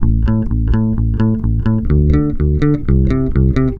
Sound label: NIGHT 126 A, Bass Grooves; USB Soundscan vol.30; AKAI